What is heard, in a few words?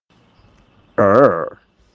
ahrrr